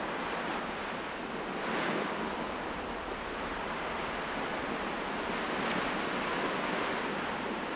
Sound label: wind; Mafie 1